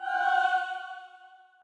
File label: choir